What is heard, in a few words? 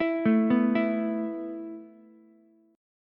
A Suspended 2nd A down Ukulele; Asus2 arpeggiated